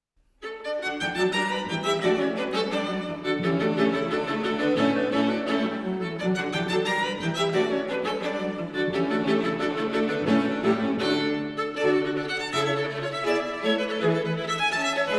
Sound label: String Quartet in D major, Molto Allegro; Mozart